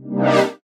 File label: SYN Vibes